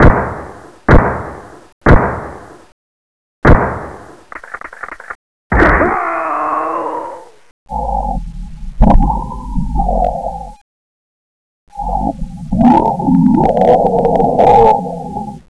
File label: tyrant kills